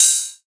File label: Ride